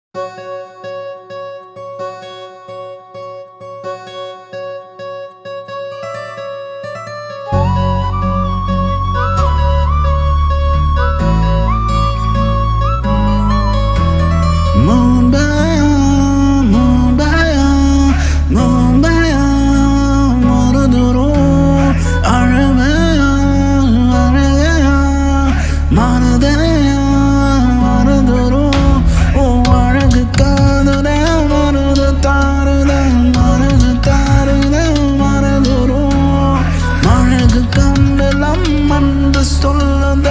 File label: Love BGM Mix; Johaar